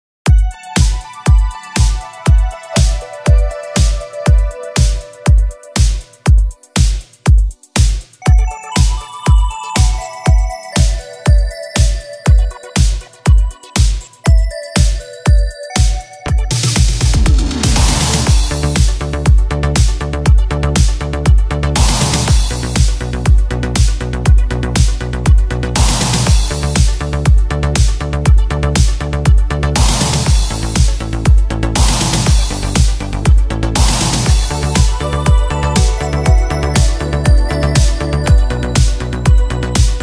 italo disco instr cover

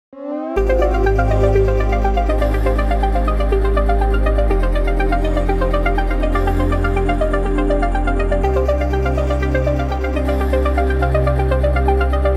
remix melodic techno

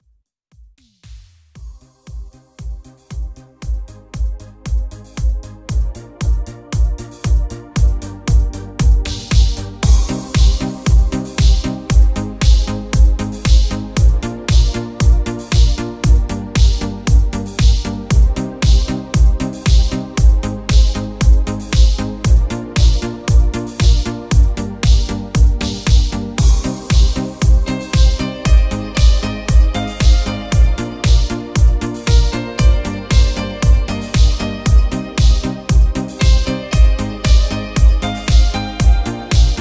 italo disco instrumental chill, Korg Pa5x; New Italo Disco Instrumental Chill; Korg Pa5x Musikant

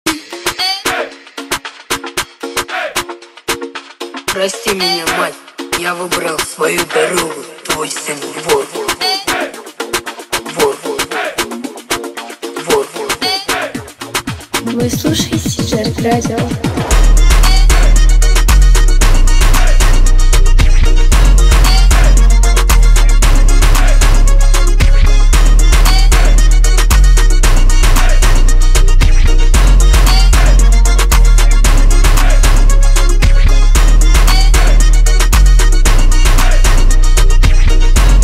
lezginka x jerk type beat

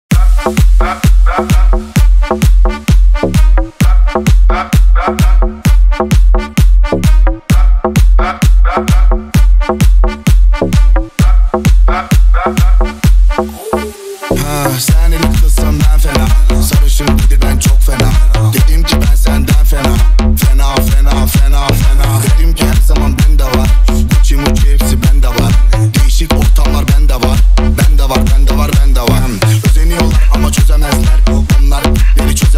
bass boosted

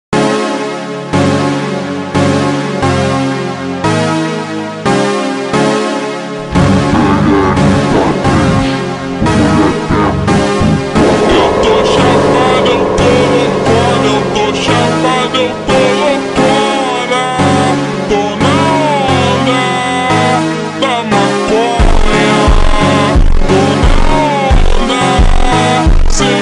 super slowed reverb

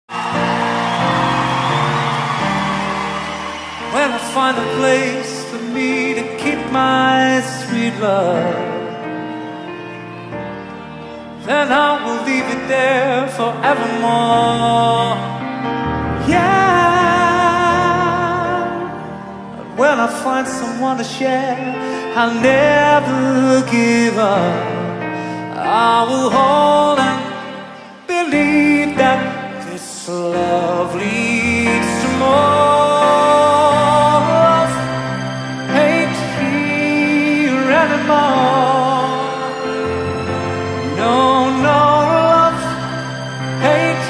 piano medley with lyrics

